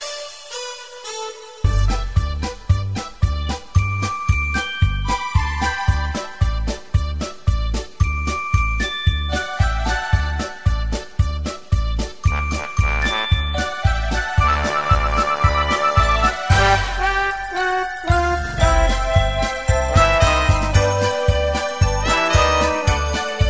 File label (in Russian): фон стихи Мелодия с детским смехом